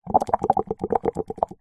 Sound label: milkshake thick blow bubbles through straw in glass short burst; food drink; zapsplat